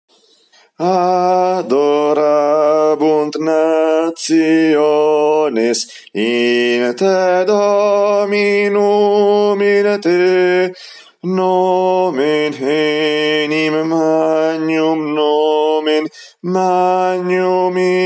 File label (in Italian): contralti; Adorabunt